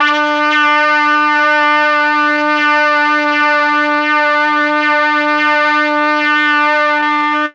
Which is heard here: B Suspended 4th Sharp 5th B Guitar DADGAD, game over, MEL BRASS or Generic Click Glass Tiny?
MEL BRASS